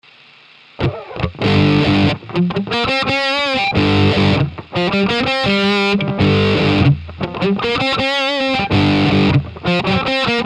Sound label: old rock jam